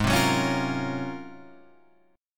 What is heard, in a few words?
Ab 13th Ab down, Guitar Standard; strummed; JGuitar